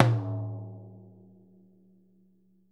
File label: ROTOTOMS; Sonic Reality - Vintage Timetraveler